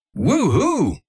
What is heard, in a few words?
woohoo